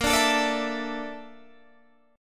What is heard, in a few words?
Bb Major 7th Flat 5th Bb Guitar Half Step Down